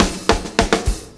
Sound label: BACKBONE, Backbeat